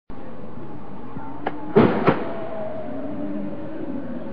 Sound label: bow